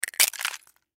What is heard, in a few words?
Egg Shell Crack Crunch Break Open; Food; Foley; Household; Blastwave